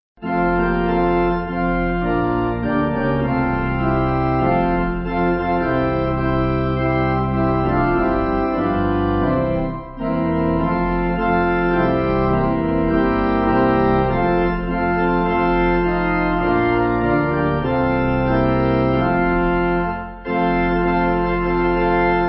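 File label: Pipe Organ; Will Your Anchor Hold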